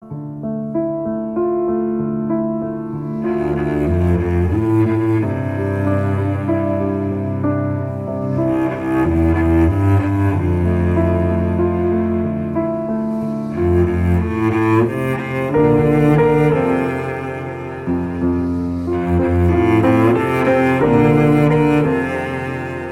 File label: Disturbed cello